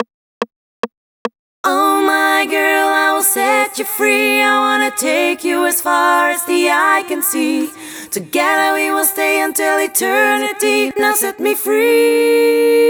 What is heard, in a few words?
Chorus Full FX, 144 BPM; Vengeance Samples; Set Free